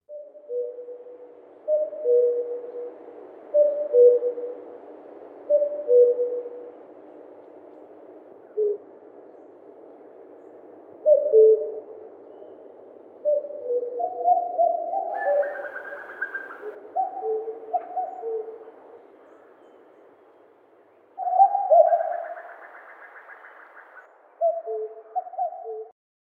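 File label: obyknovennaya kukushka golos, common cuckoo voice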